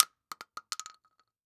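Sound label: Weapon Bullet Shell 12 gauge Dropping On Cement; AdobeCC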